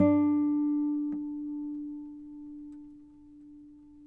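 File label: nylon, FLAMENCO, Guitars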